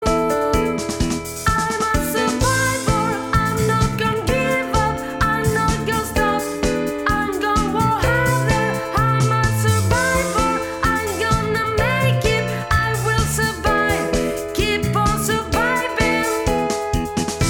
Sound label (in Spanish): SOPRANOS Y TENORES; ADIOSES SHOW CHOIR; PANDEMIA